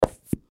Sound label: Suction Cup Down; Foley; Blastwave